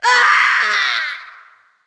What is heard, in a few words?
Wound Heavy, Creature; Fjola